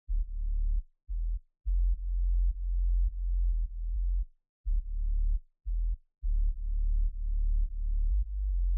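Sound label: D minor, 105, Bass (Sidechain)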